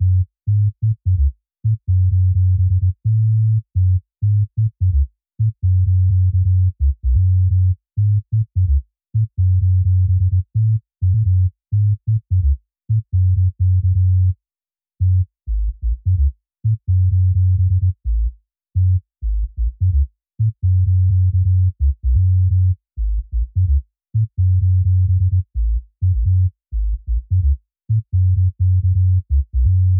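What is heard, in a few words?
128BPM Bass Sub noSC, F# minor; Demo Constr Kits; Let's Work It Kit; Bassline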